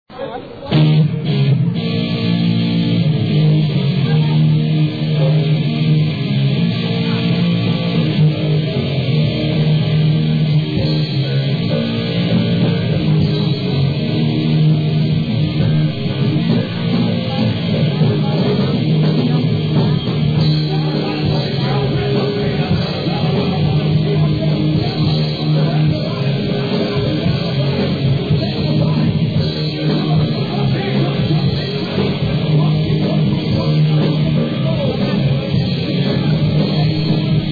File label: banjo live